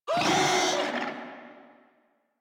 Infested Charger Death, Enemies; Warframe

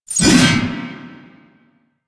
Backstab Impact Target; Warframe; Warframes; Pax Duviricus

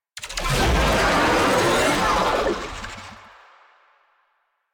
Infested Oni Vocal Idle; Warframe

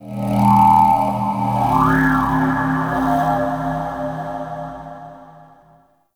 Root Cave Didge Texture, Ambience; One Offs; Neathuns Root Cave

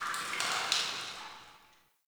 Under City Alley Water Drips

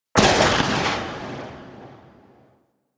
Fire Tail, Rifles; Weapons; Warframe; Snipetron

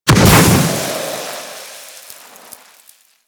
Ghost Death Explosion; Warframe; Quests; Chimera Prologue

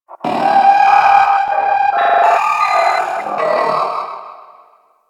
Eye Pain Cry; Ambience; Orokin; Warframe; Orokin Moon

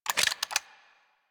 Sniper Rifle Reload; Gorg

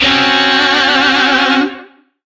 Shawzin Hirajoshi Chord; Warframe; Lizzie Shawzin

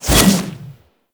Melee Jump Slam Large Charge, Whooshes; Weapons

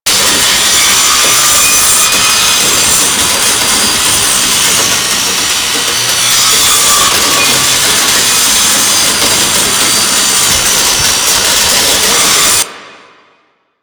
Saw Loop; Warframe; Grineer; Saw Weapon

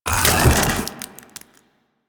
Splitter Split; Warframe; Infested; Grey Strain

